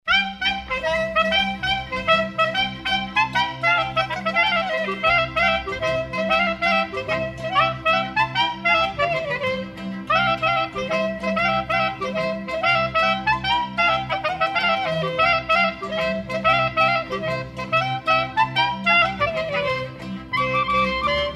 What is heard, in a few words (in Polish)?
Kapela polka galopka